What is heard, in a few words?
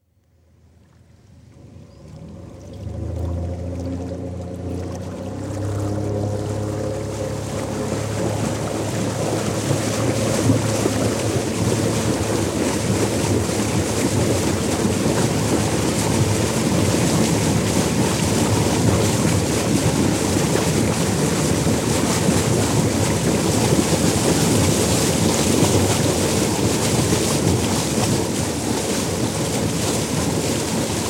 Cabin Cruiser, bow-wave, start to full speed, constant run, stop (Recorded on board (27ft, 50hp diesel engine); Boats; BBC